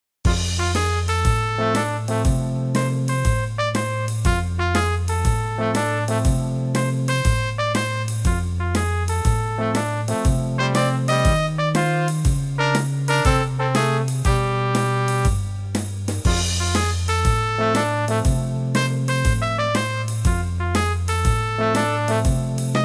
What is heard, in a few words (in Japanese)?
スローテンポ; casino; ジャズ; ファンタジー; 穏やか